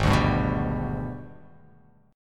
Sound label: G sharp Minor Major 7th Flat 5th; strummed; JGuitar